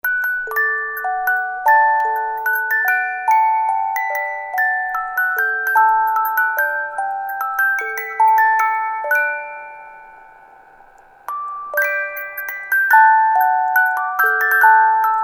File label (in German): Guten Abend 16 stimmig